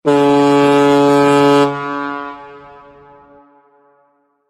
Ship Horn

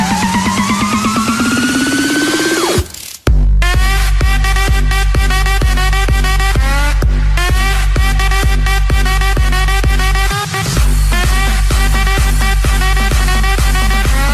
Music Box

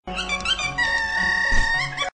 Piggy Bird

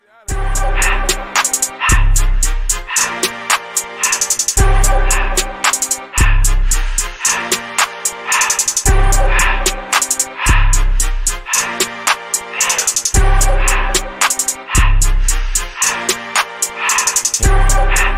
Hot Instrumental

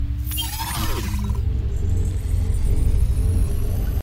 HUD Activate

